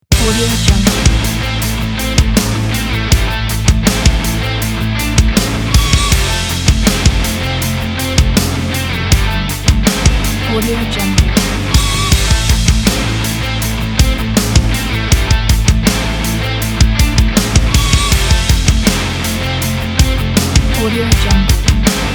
tool machine men rock; landhausstudios

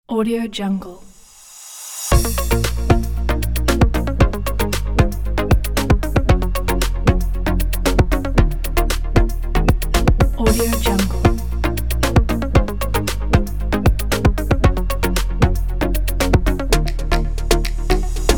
high technology

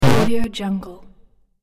platform hit

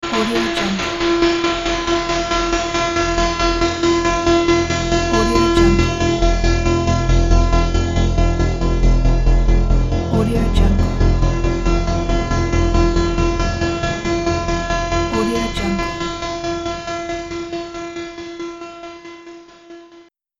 oscillating frequency

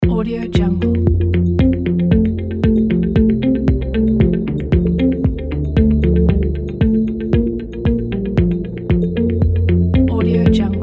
science background music

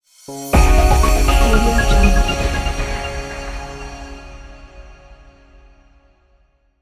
uplifting logo

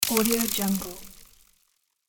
skeleton bones fall

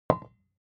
object glass vodka put down; smartsoundfx

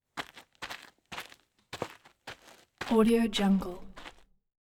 footsteps on gravel; collanderson

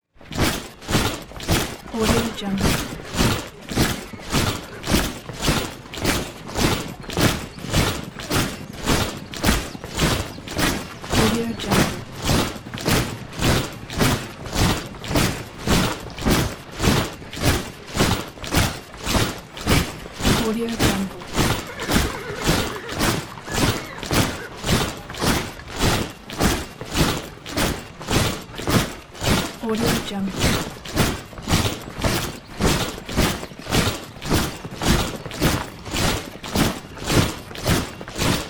marching medieval army

